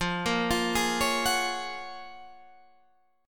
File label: F sharp Major 9th